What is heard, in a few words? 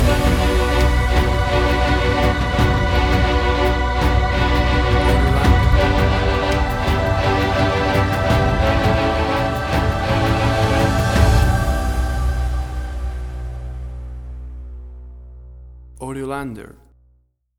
Big Finale Choir Strings; A powerful; AUDIOLANDER